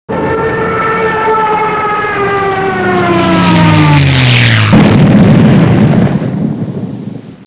dive bomb

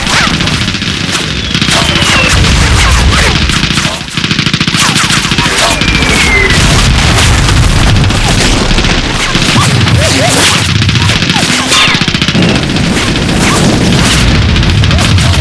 dog ambience

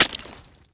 knife hit

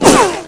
whizz, bullets

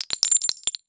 webley reload shells

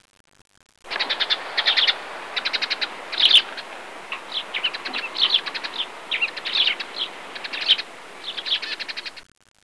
forest sparrow

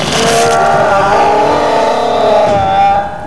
scream

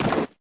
player snow

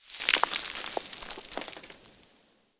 pebbles

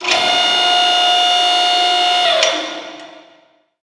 door

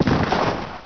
bust concrete, debris

